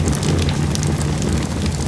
torch; Fate

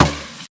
bow drop; Fate Undiscovered Realms; ITEMS

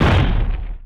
mid step; Fate The Cursed King

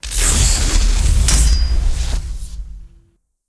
sword; Fate The Cursed King; SPELLS

